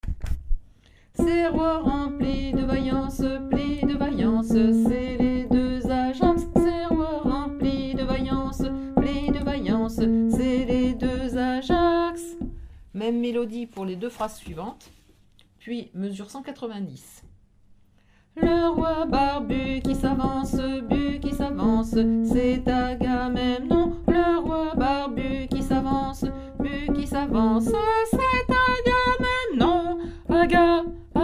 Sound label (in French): belle helene basse